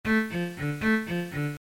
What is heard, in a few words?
Earcons Edit; oviatt